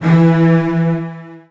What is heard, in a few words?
E2, Virtuoso Strings, 16TH; ILIO; Kirk Hunter; CL 16TH